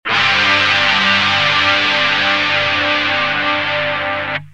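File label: guitar clone theory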